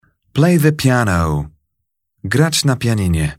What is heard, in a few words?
play piano